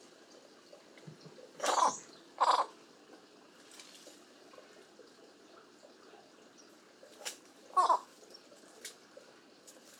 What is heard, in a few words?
ardeola bacchus, chinese pond heron; kagashirasagi